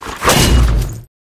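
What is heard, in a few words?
Rat attack mid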